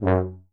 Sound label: F#2 TUBA